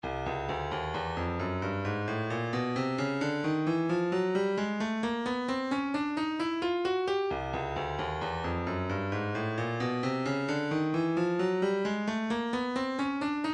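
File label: third percussion 3 4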